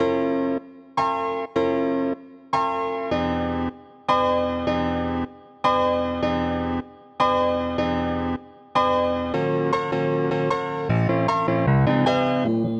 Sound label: piano; Pony Fan Music Remix Stems